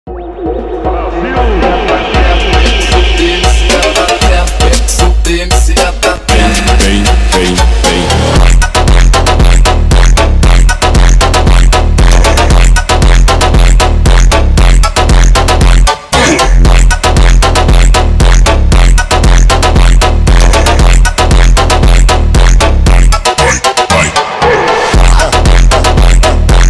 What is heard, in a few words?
slowed reverb